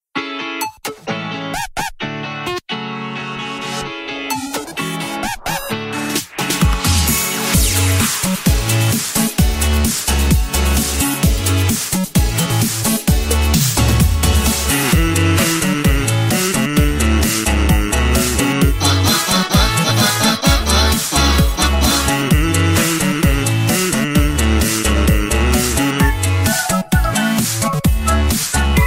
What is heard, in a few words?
remix; undertale